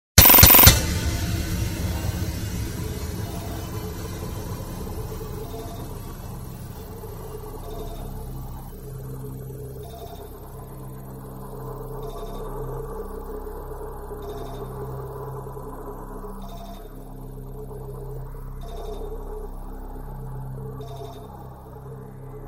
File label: Galery Noise; Sound Ideas - Galery Noise 002